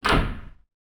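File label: door close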